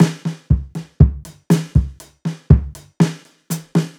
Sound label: Jazzfunk, Loops